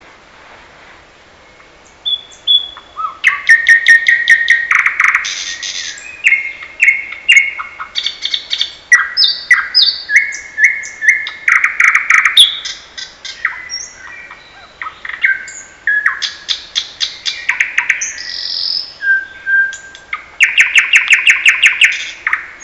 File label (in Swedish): Naktergal